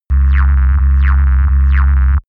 engine room radar

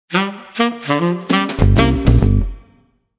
jazzy sax

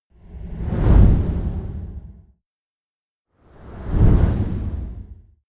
push

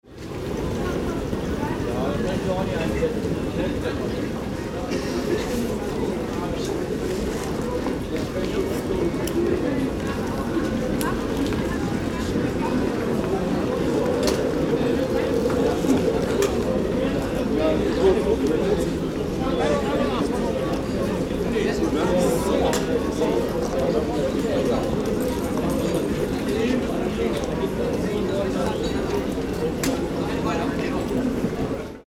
marketplace crowded

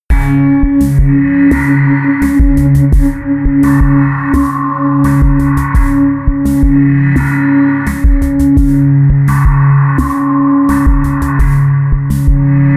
sequence dark beat

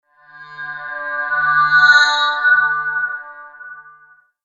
swell vowel

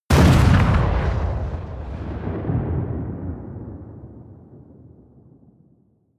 missile small explode close

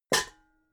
pots hit soft

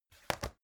folder close

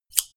scissors close

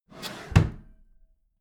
empty drawer smooth close heavy

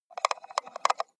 computer mouse wheel scroll slow

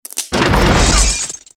destruction body impact

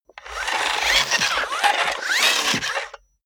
robot movement long

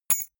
key ring small drop carpet soft

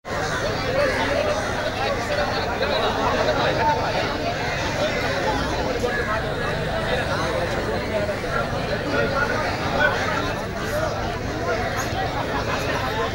street atmo sri lanka

